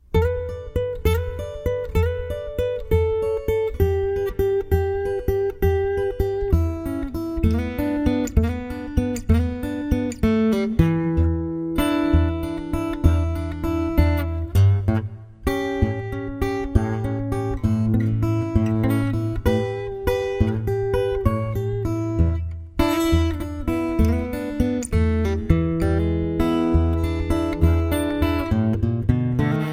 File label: chorus, dead thumb fingerstyle